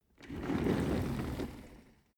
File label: office chair trundle fast long